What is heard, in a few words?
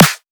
snare variant